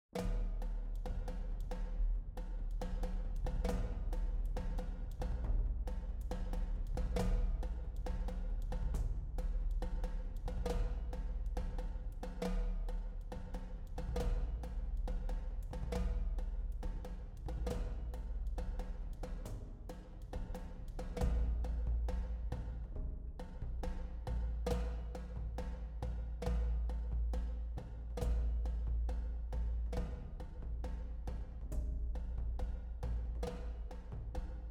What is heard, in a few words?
Cinematic Simple Percussion; amperAI